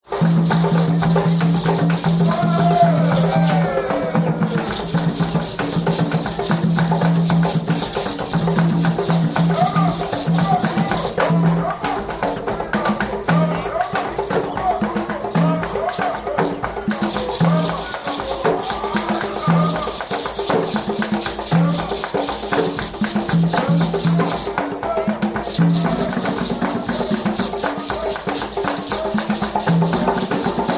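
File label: African Drums Party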